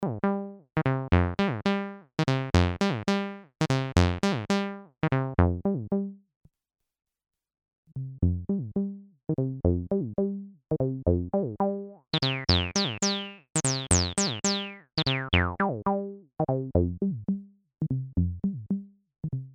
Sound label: TD 3 MO default Cutoff resonance